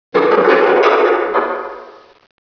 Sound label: door jiggle; ambience; rebar